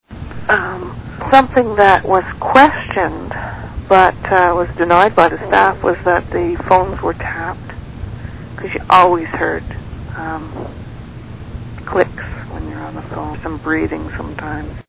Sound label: tapped